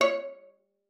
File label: C#5, VIOLA PIZZ; Xsample VOL-5; 90 s Sample CDs